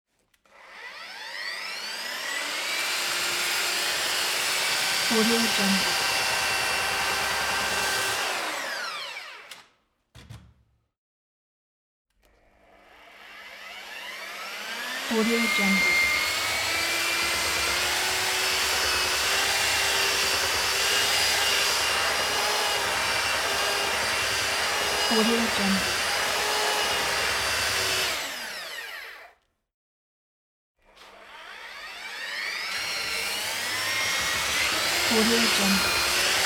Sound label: plank wood grinding